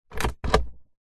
vehicles suv centre console compartment heavy lid nissan patrol 2019